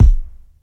verby rap kick drum sound b key, Royality free bass drum single hit tuned to the B note